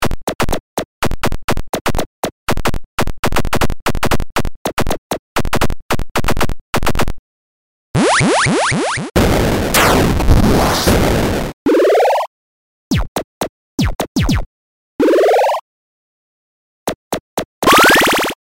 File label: 8bit Fight; Gauntlet